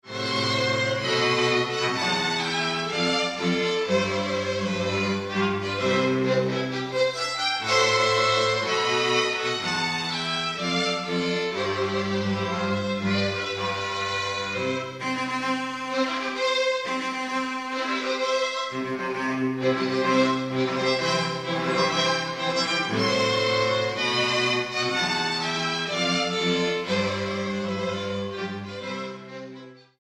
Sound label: strings wedding march